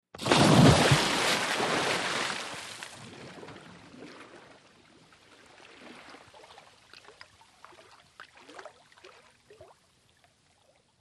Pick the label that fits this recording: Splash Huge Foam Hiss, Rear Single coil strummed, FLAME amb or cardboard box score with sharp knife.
Splash Huge Foam Hiss